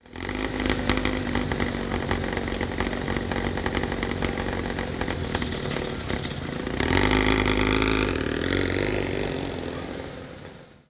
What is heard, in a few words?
Scott Flying Squirrel